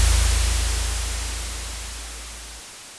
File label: ambient; halloween